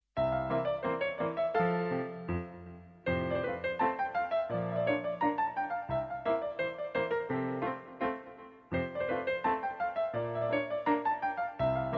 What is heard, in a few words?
polka